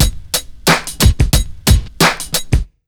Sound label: 90 BEAT, Pure Electro; USB Soundscan vol.03; AKAI